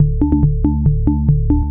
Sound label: Bass; Techno Music Maker